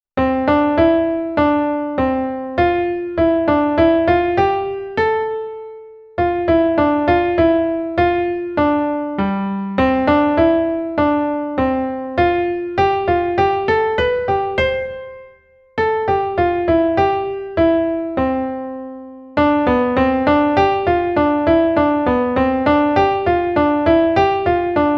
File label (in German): melodie